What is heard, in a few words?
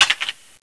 deagle clip out